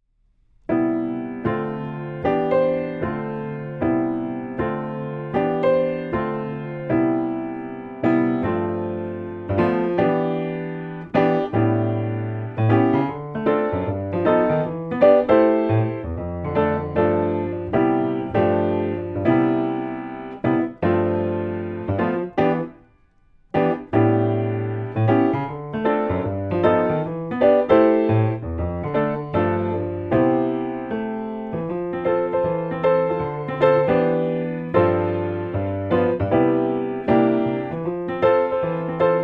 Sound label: Piano